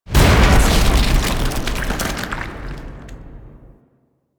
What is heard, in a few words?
Rupture Explosion; Warframe; Corpus; Arachnoid Hunger; Arachnoid Kahl